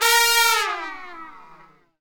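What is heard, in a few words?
trumpet fall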